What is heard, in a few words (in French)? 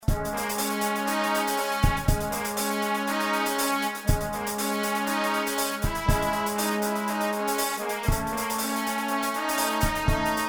Trompette Trompette Trombone Batterie